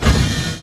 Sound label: Sentry Turret Scanning Start; Boost Card; Titanfall 2